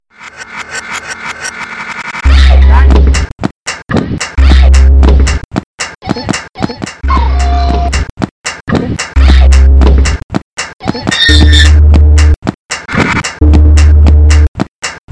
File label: Soundscape